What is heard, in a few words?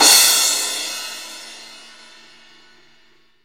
crash, dry kit